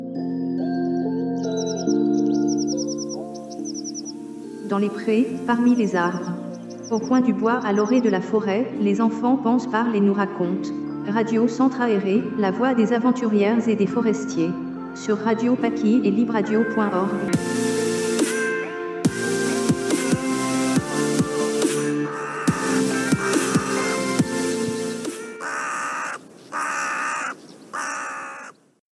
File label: Jingle